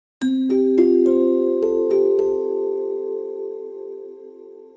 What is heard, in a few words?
ringtone